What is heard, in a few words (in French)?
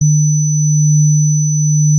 acouphène